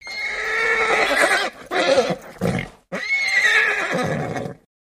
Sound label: Horse Whinny Excite; milling vocals